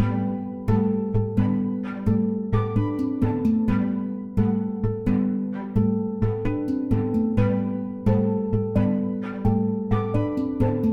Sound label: Victory Loop; Alchemic Jousts